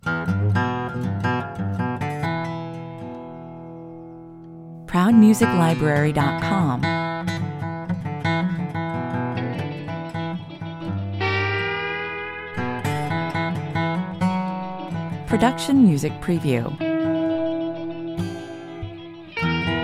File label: old timer 115 bpm; Proud Music Library